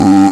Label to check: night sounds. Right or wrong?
Wrong